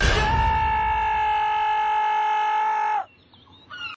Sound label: Scream; Jack Sparrow